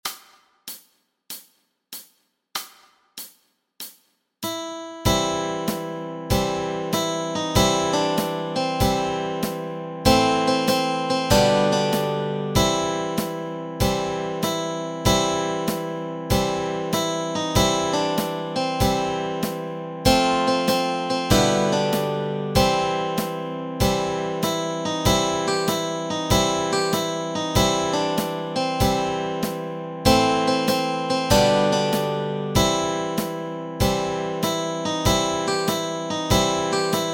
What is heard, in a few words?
melody and chords